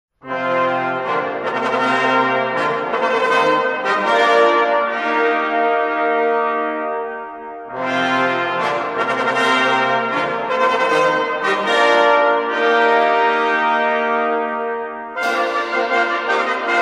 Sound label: fanfare